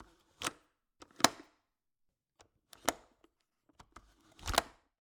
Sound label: Cabin, window, latch, flip, lock, open close, hyper; Eiravaein Works; Vaeyan IV